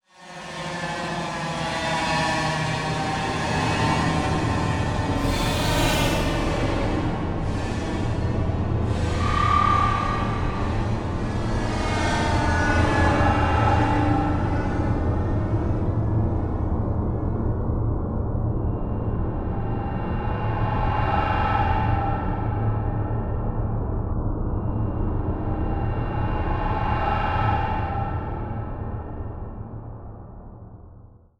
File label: Choppy Digital Swells; Module Production Element Title Imaging; Imaging Elements